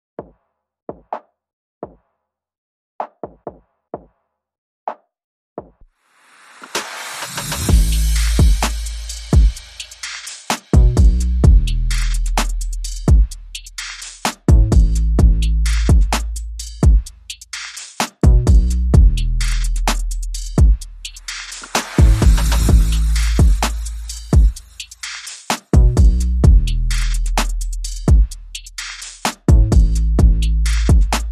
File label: Drums Bass and SFX Only